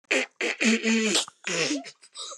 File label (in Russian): truba